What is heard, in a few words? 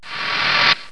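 Disintegrate; Death Blade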